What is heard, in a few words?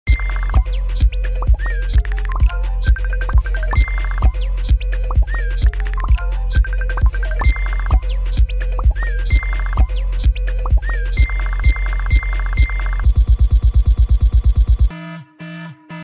cartoon music ringtone